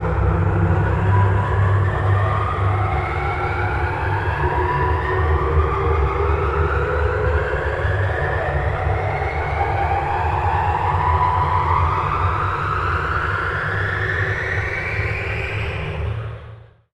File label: engine revup, spacecraft